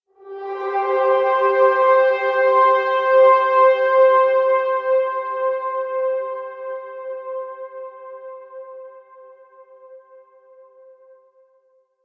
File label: Cathedral Fanfare; Musical